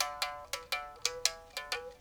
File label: mouth bow, Africa